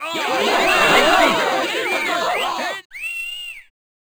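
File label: big burst, Crowd German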